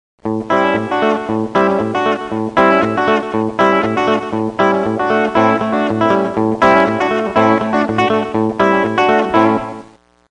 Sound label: rock rhythm, guitars